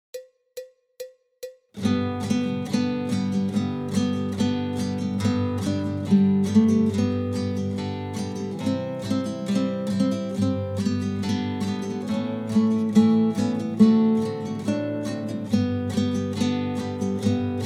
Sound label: Jingle Bells